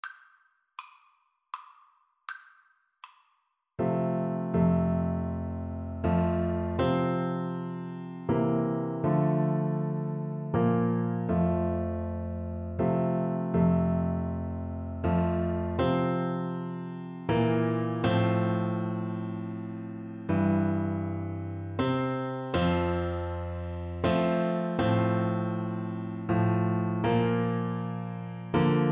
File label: amazing grace, Tuba